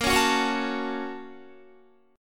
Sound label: Bb Minor Major 7th Sharp 5th, Guitar; BbmM7#5 strummed